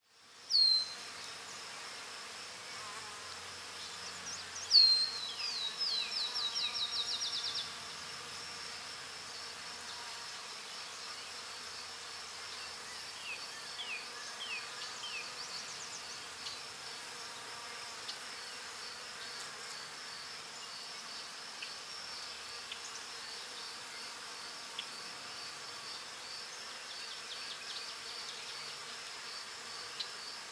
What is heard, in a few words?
Forest Bees Birds; Blastwave FX